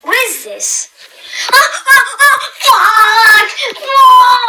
ahhha hahaha

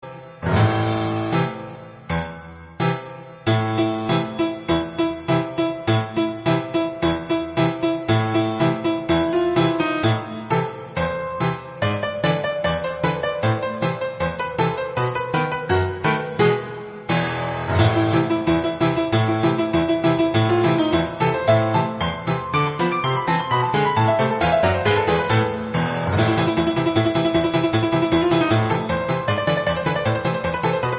piano

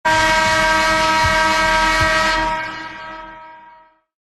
buzzerr

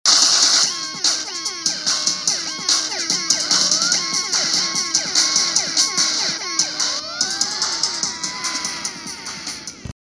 air horn frenzy remix dj

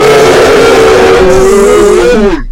screamer; nightmare mallies

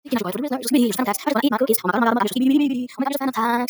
fart loud

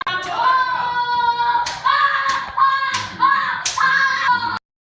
whooping

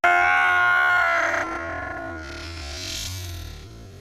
roaring

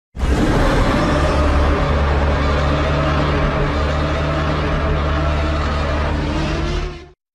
godzilla roar; evolved

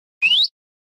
loud whistle parrot

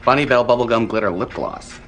bell

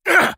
grunt

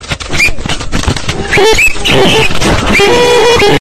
squeaky scream

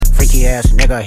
freaky ahh

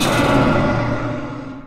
jumpscare; golden flumtpy

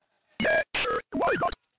angry

